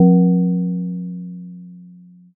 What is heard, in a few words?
gong